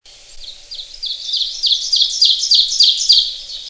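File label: Ovenbird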